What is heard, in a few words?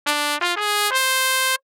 phrase short rel, trumpet; samplemodeling